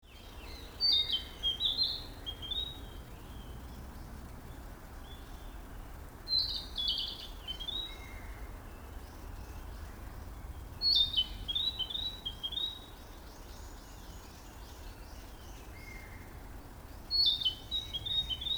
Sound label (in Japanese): kibitaki